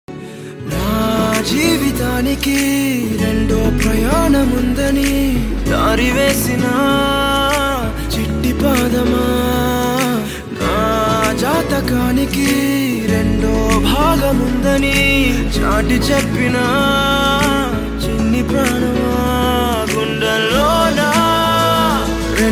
telugu love songs

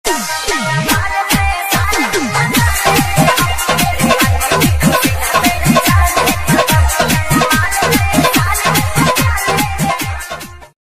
happy new year ringtone song

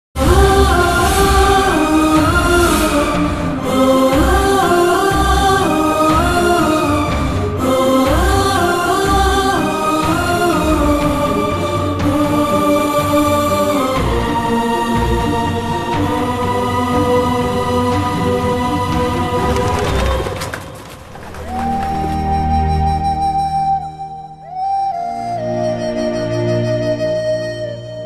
kannada movie emotional bgm; charminar; prem; kabza

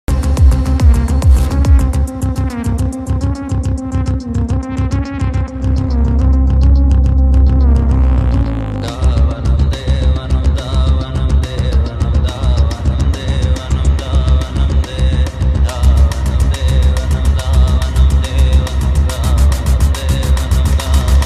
teaser bgm ringtone bass boosted; bramayugam age of madness; mammootty

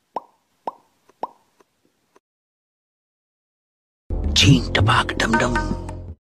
ringtone notification tone

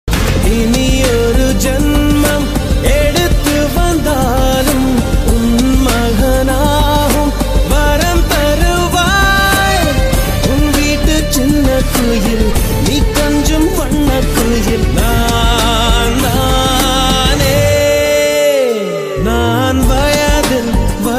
tamil amma love status

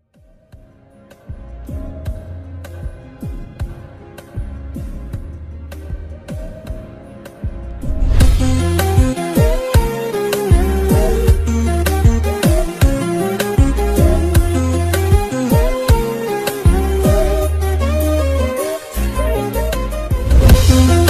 instrumental

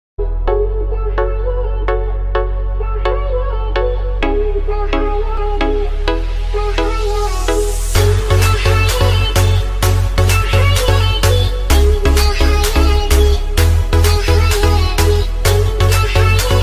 arabic remix ringtone

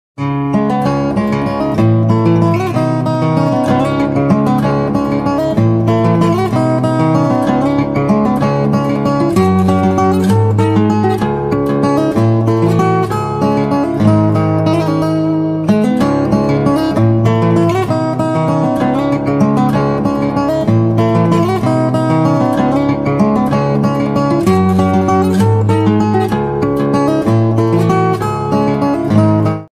ringtone music guitar; jack sparrow

